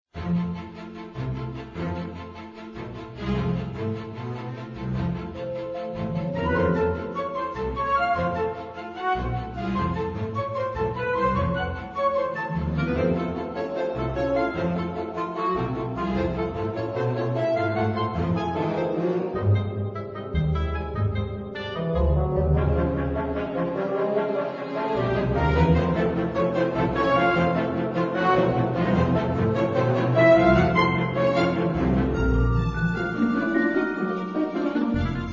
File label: Game Music Loop; android ios gamerip 2013; free birds baby turkey trouble